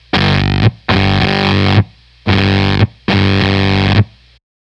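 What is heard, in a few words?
Fuzztest guitar preamp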